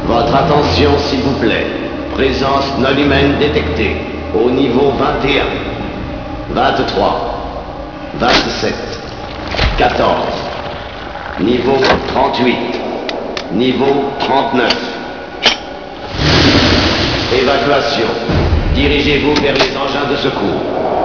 alien

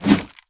punch miss; weapons; deluge

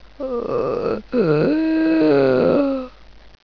mummy idle

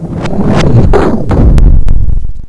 pit drone die; svencoop; sectore

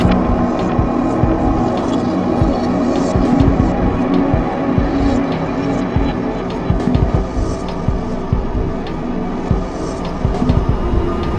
bossfight loop; music; morass beta

